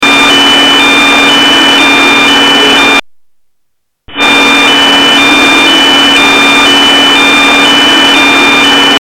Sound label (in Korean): 이상한소리